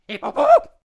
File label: Monkey King Attack Vocals